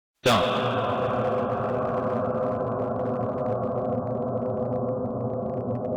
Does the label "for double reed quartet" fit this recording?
No